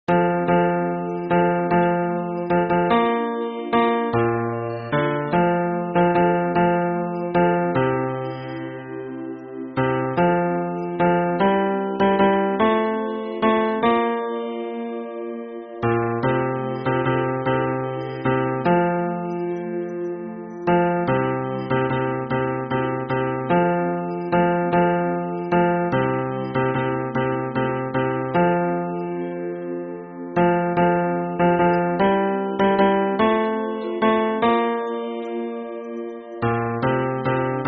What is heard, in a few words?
hymn bass; 末日聖徒イエス・キリスト教会